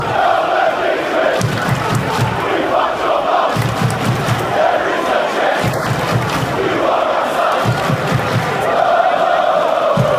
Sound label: chant, fan chants; melb victory